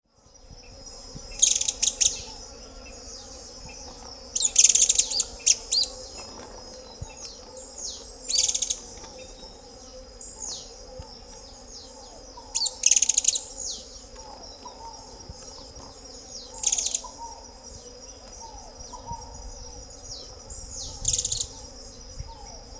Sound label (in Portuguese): canto do cara dourada